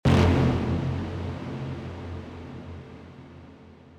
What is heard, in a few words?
serum preset brass huge